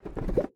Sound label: Check Furniture; Game rip; MiSide